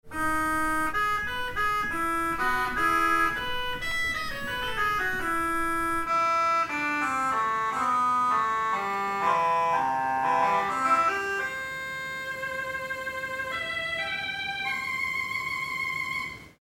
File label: Kinura, orgel